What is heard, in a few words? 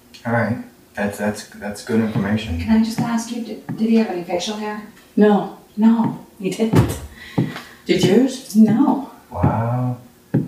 Footsteps coming into military room